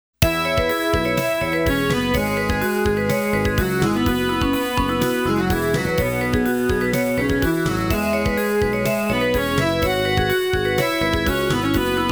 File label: Shouronbon (Synth Version); Super Famicom Magazine Volume 21; New Game Sound Museum